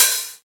good open high hat b key, open hi hat tuned to the B note